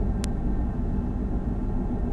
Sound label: cargo, backgrounds